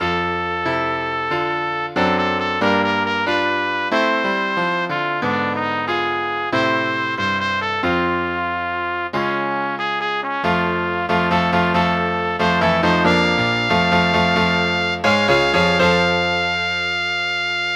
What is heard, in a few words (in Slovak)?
Zdravica, pre tr. a klav.